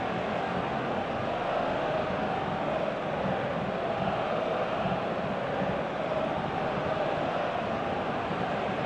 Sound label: crowd ambience